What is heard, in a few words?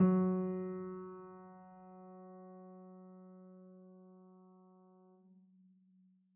piano sampler